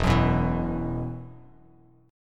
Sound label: E Suspended 2nd E, down, Bass Standard